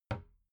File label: cabinet door close soft